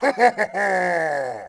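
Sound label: Attack Vox; Sid Meier's Civilization 4 Complete; Warlords; Units; Omen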